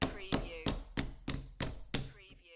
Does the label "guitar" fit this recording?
No